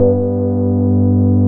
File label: DREITON G2, SYNTHS; SE-1